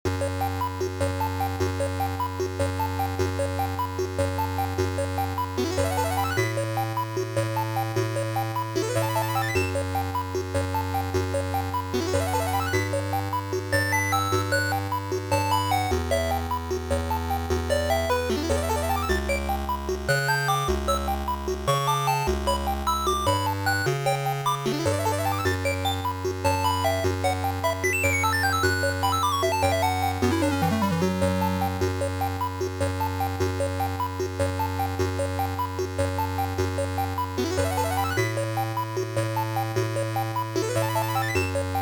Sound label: BGM, WonderSwan Color; Shaman King Asu he no Ishi